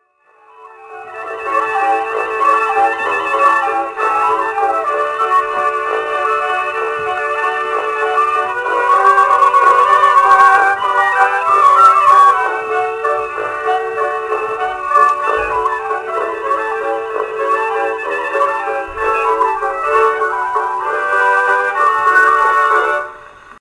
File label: cuckoos waltz